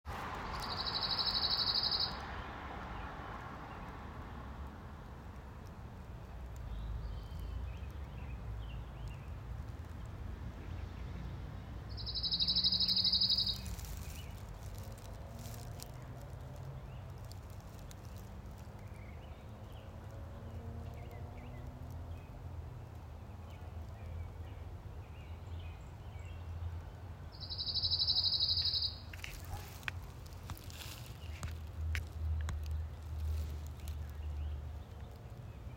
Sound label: Pine warbler